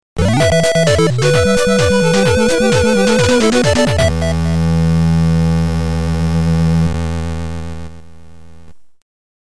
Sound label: jingle; Street of Rage; NESpirit